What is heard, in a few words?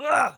grunt; running back